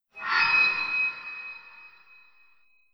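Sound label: underground ambient; blackforest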